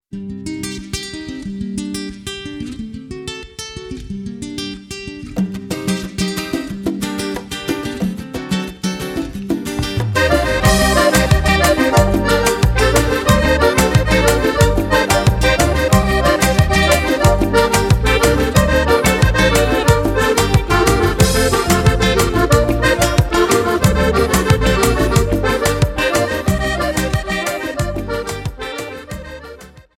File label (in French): cumbia